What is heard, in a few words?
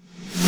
Roland JV-2080 REV NatrlSN1